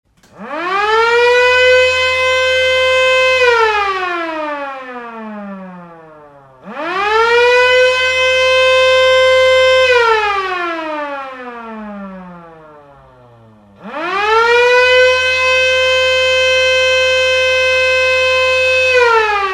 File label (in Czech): vzduchova sirena 24v 118db chromova